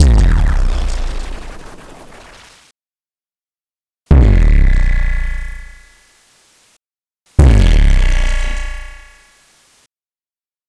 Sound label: Kick Distorted Feedback; Sliced